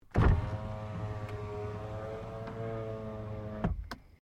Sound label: CAR SUBARU LIBERTY INTERIOR WINDOW OPEN